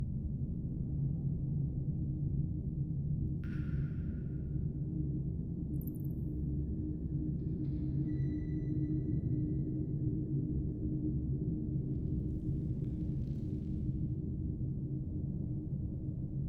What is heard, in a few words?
Cave Loop, Ambient; Fantasy Sounds Bundle